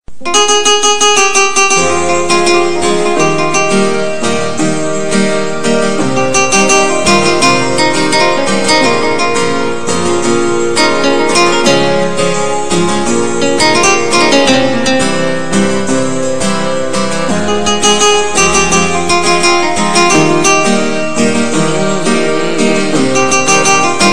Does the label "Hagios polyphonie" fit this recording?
No